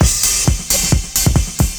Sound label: CYMB, Breakbeats